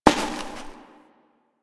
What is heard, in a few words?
Step Ice; Warframe; Koumei